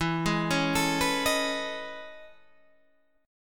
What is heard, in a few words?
A Major Sharp 11th E